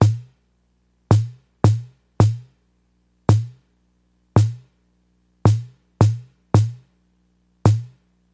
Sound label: Ambient House Kick Snare 110BPM